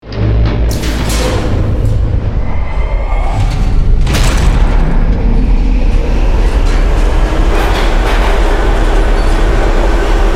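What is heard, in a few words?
Ambience Shuttle Travel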